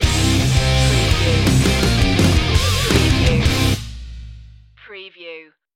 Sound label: conviction stinger